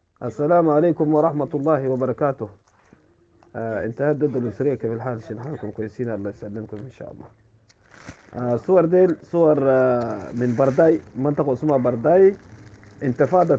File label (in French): Vocal; bardaï